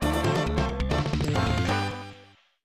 Unknown Jingle; kidou butoden g gundam 1994 snes

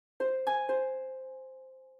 Harp; Arc Apellago

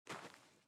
step sand; out of reach treasure royale 2020 gamerip pc

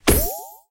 Stamp Pink; Candy Crush Soda

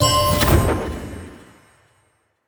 Heart Container Collected; Ori and the Blind Forest

